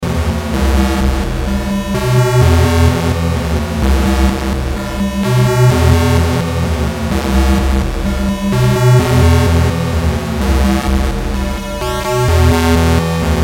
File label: ARP